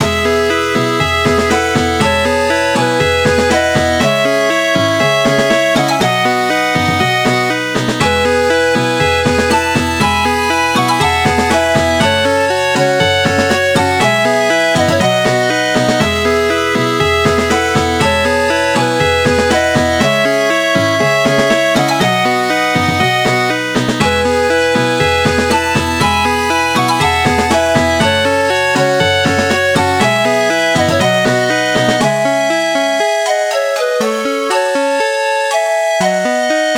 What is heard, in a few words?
SNES RPG overworld loop